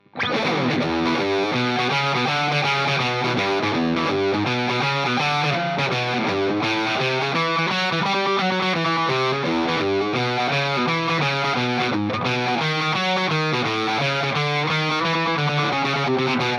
lp snapper; vol12

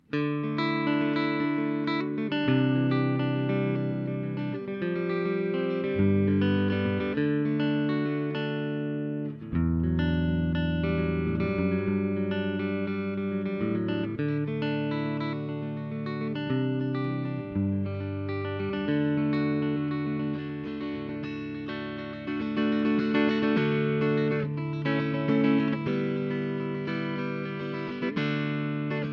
coil split

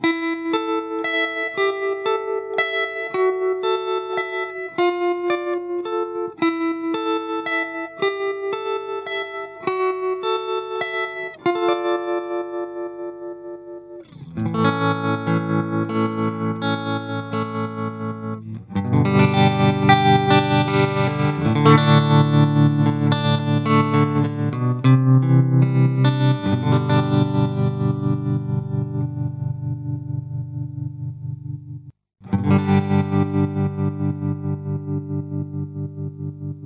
clean strat neck; uraltone

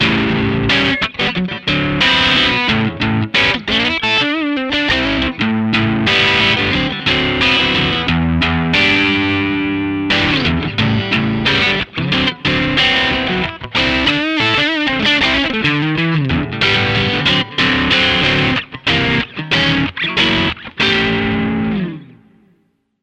blues strato keski; v-twin

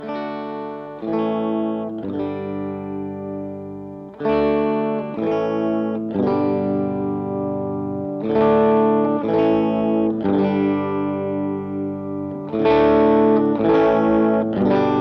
orange ch1 strat bridge

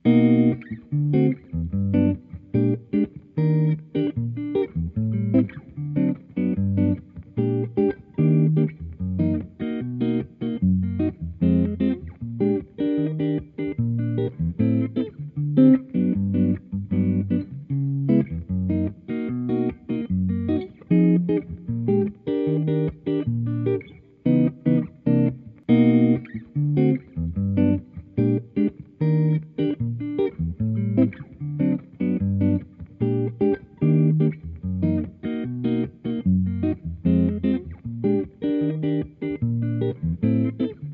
tausta; peavey

